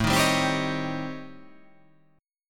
A 7th Sharp 9th Flat 5th; x,12,13,12,12,14,15,12

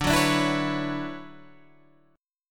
D Major 13th D down Guitar Half Step Down

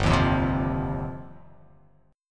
G 7th Suspended 4th Sharp 5th

